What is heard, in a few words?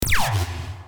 laser